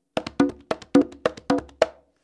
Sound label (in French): roulement ternaire, congas